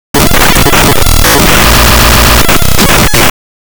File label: glitch